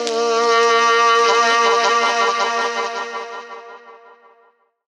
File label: BLO SAXMU FX; Rawcutz - Nu Forms; Gbm